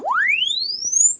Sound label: Press Two Sides Chirp; Samsung 4 Recordings